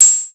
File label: VCF9, HOUSE; Crypton - DrumBase5000; HOUSE #1